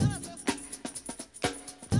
125, Classic Drum Loops

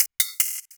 hihat combo breaker; Polyend; Recue

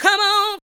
ADLIBS 90; Ueberschall; Jam Box

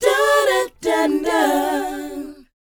DOWOP A; ILIO; Vocal Planet

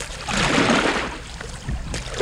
ROW, Boat Rowing

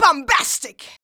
VOX, Singin

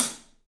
Paiste hat, drums; 3D Sound

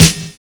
CHIMES; Univers Sons - Kitch 70s

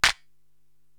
Vintage Clap; Korg TR-Rack

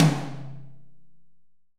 H AMB H TOM, HALL AMB TOM

